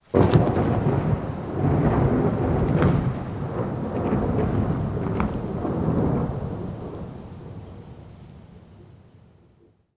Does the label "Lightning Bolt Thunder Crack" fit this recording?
Yes